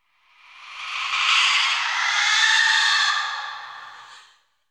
Ghastly Screams; MinorHallutinationsSounds; SFX Producer

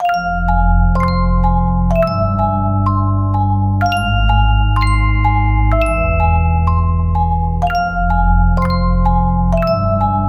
Horror Musicbox

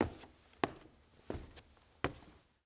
Stairs Down Wood; Footsteps; HauntedBloodlines; SFX Producer

